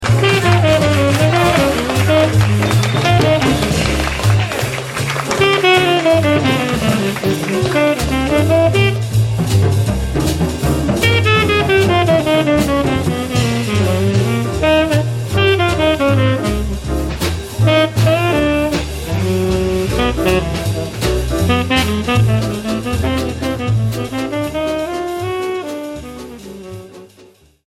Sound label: solo